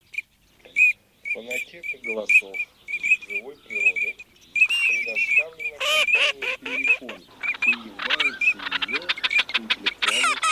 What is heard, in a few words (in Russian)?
Чирок-свистунок Alzavola; plurifon